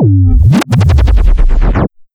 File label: Dematerialization; Celerity